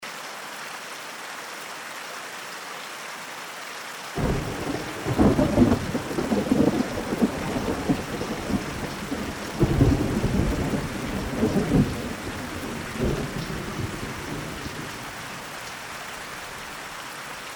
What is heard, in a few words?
Thunderstorm